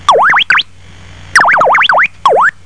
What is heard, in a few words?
birdy; MEISJE